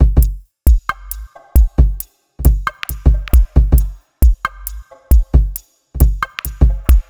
Bpm135 Break, dubstep drum loops; Babylon

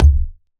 Kick

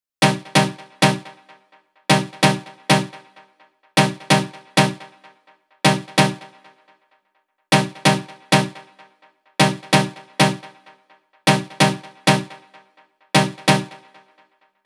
Progressive Kit Melody; Mutekki Media; Vengeance Electroshock Vol.2

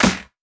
Claps & Snares

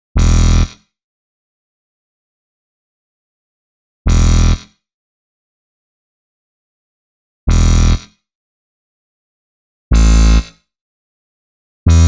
123 Em Bass, Complex Electro Synths; Prime Loops; Onyx

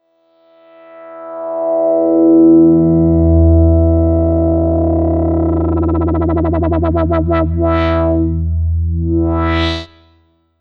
FX Uplifter

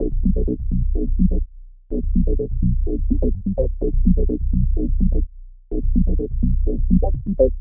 126 B BASS, Funky; Loopmasters; Funky Underground Vol 2; BASS LOOPS

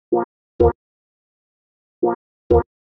126 Bb music, Funky Underground; Loopmasters; Funky Underground Vol 2

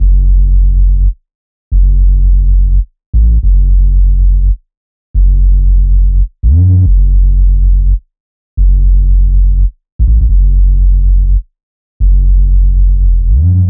Bpm140 Bass Loop F#; Temptation; Prime Loops; Dubstep Illusions

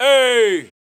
VOX

